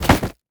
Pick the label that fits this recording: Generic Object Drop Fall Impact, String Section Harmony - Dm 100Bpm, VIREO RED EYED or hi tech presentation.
Generic Object Drop Fall Impact